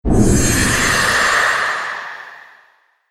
Demonic Voice Impact, Monster Sound Effects

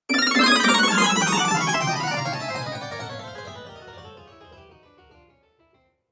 Fail Game Loser, video game

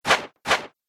Dagger Throw Double Whoosh; Knife Sound Effects

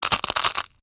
Crunch Ramen Noodles; Texture; Crunch Sound Effects; Objects; Blast Wave

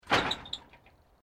Metal Corrugated Iron Wind Movement; Material components to create more complex sounds

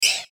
button squeak; Multimedia; Blastwave

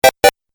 8 Bit Style Music Accent; Video Game

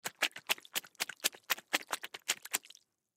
Splash Puddle, Water Splash Puddle Multiple Small; Blastwave

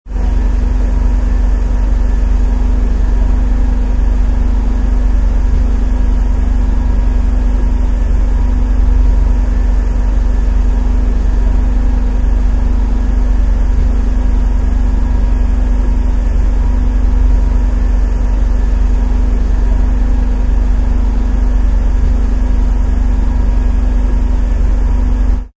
Truck Engine Idle, Truck engine idles; Trucks; Sound Effects:Vehicles

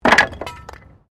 Wood Metal Fall; Material components for constructing more complex sounds; Materials

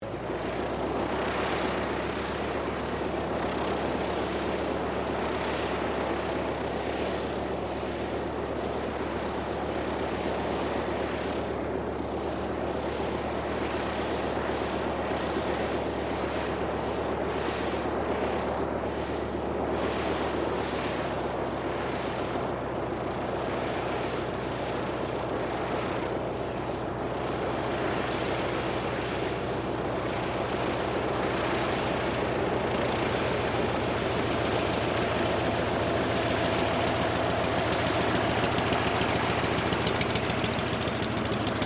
AIRCRAFT CAC WIRRAWAY TAXI LONG; CA-16 Wirraway; Airplanes; Vehicles

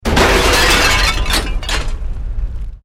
Explosion Medium Metal Glass; Created explosion effects, house, building or vehicle effects; Bomb Sound Effects

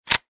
Machine Gun Foley Chamber Closed; Machine gun, type 1: Chamber closed; Gun Handling

